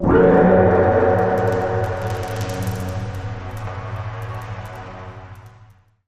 Harsh Static Chord Transition

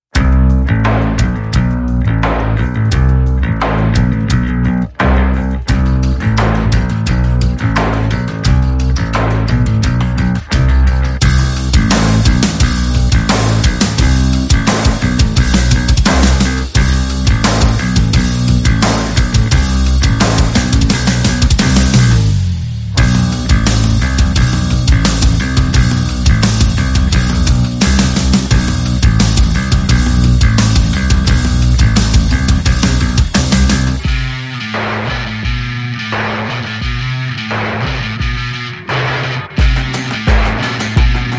No Guitar

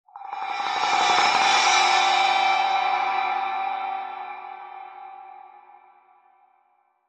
Tranquil Glass Splash Roll

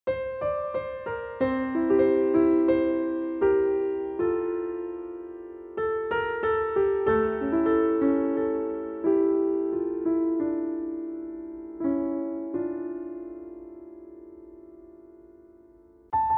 Solo Piano

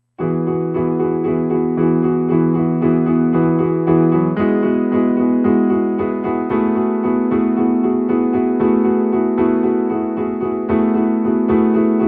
Cabaret

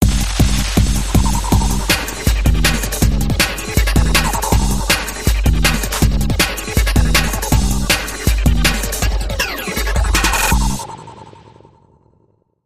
Dark Electro Main

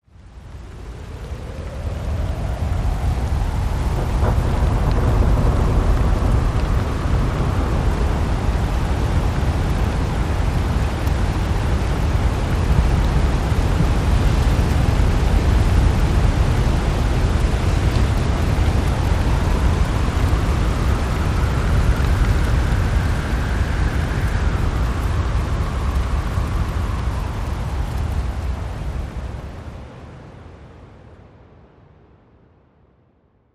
Snow Storm Atmos

